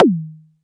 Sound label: Tom High